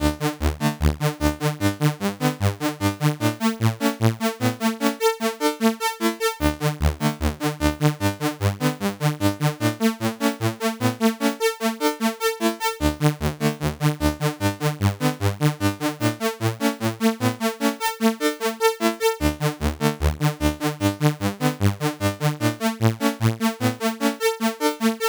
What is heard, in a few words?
c64 in game; lazy jones